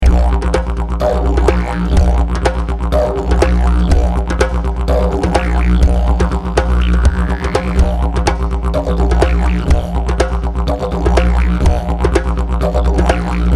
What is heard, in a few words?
Energetic Tribal Music